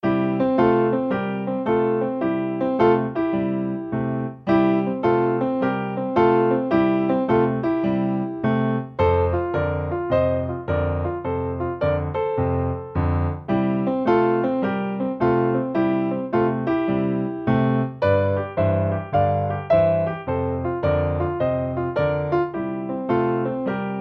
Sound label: Right and left hand Complete, piano blues